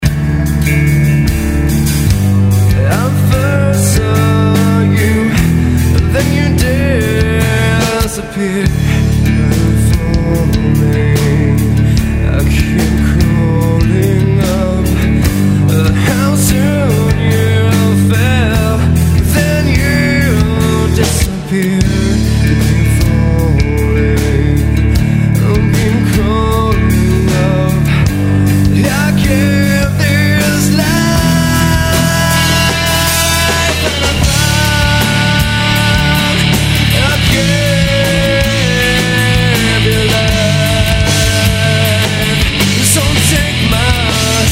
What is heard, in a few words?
Cellos